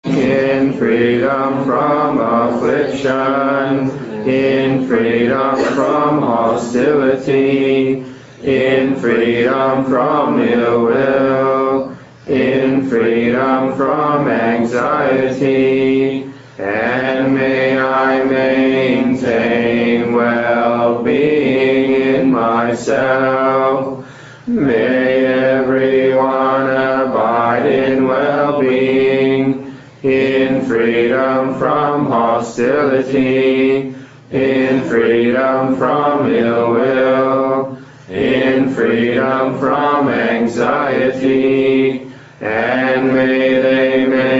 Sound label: Chant Well Being English; Retreats; Chants